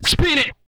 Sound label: spin it, vocal, hip-hop; TB HIP-HOP